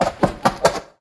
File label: Move; Mesukemo-Hime-no-Kaisuiyoku